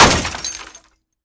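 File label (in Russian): звук разбитого граненого стакана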